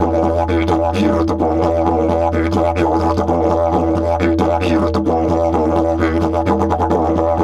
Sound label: E 130, Didgeridoo